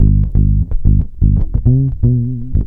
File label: ACID JAZZ